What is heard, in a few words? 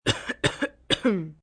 Cough Kid Light, Cough, child, boy, light cough (human voice)